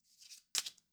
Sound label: move stack in hand